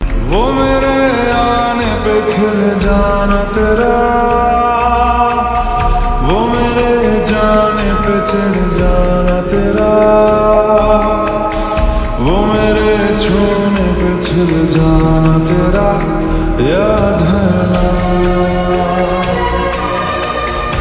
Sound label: slowed hindi ringtone